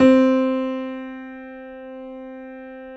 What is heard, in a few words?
JAZZ C3, Keyboards Acoustic; AKAI; USB Soundscan vol.17